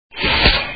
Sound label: door